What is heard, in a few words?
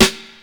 Loud Snare Drum G Key, Royality free acoustic snare sound tuned to the G note. Loudest frequency: 2816; snare drum samples